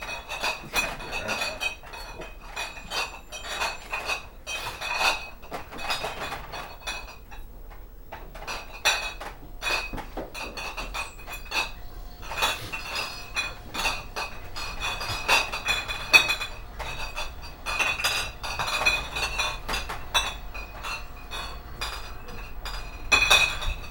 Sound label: gentle constant clinkle